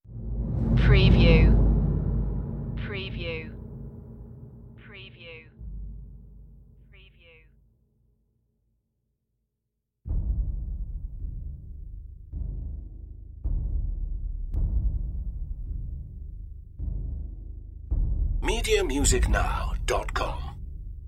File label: perc mix no tubular bells